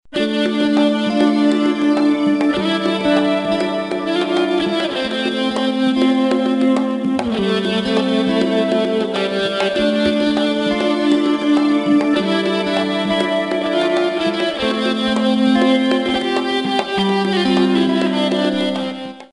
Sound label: Solo improvisation